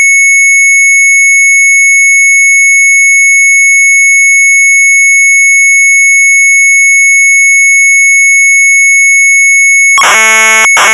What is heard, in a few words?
MZ800 Tape; sharp; KALEIDOSCOOP; retromelsarchive